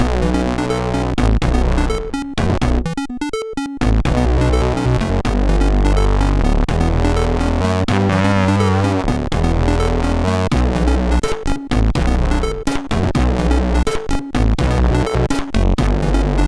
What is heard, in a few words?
Game Music, sid MOS6581R3